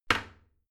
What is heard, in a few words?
toilet seat close heavy; haus-garten; badezimmer